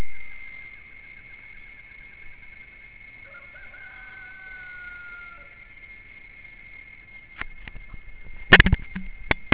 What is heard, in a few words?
island sounds